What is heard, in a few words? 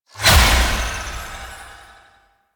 Alchemist Transmute Ability Impact; Warframe; DE; Warframes